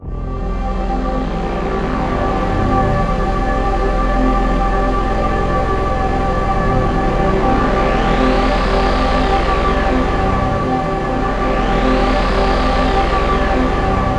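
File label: soundtrack, ambient atmospheres; Ethereal Atmosphere; USB Soundscan vol.13; AKAI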